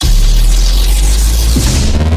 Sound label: laser charge; overseer